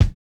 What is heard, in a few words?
kick, house